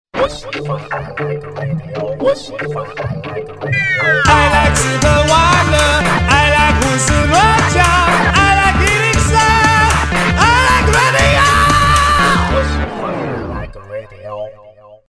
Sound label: electronic Jingle